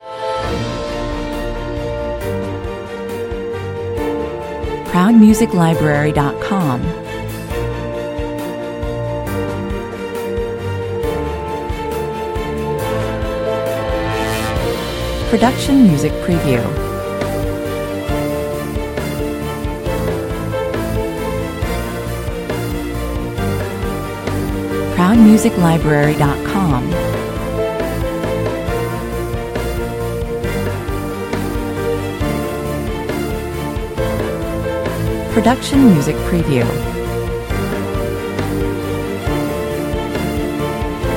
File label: no choir